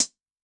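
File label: Heavy Close, HiHat, Yamaha DTXpress-II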